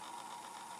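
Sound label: motor fast forward loaded; Mass storage; TS2020; zxsp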